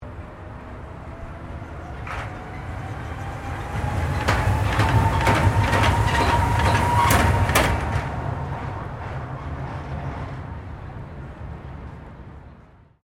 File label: MONORAIL PASS; Sydney; Syney's Monorail system; Trains; Vehicles